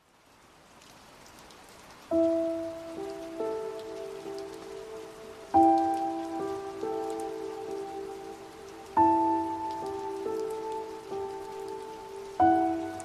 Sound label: Rainy Mood; Bikalam Baran